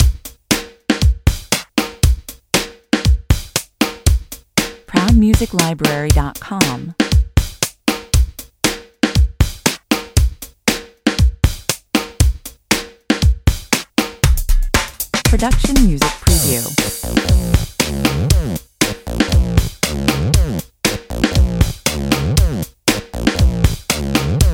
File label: drums and bass, 118 bpm